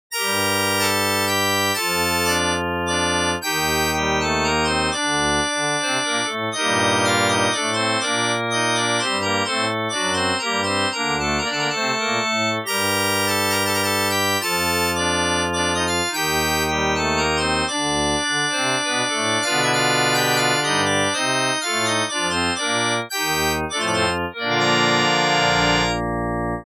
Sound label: Church Organ G, Minuetto in g minor, dry; Andreas Instrument Sounds AB; Church Organ G - Dry Demo